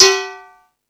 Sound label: Percussion